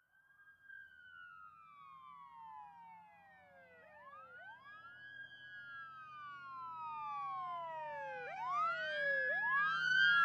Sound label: Ambulance By Fast